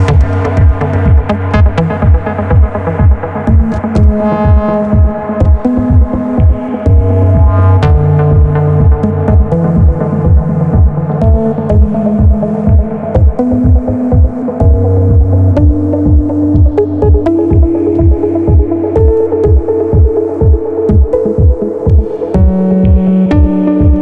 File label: Underwater Acid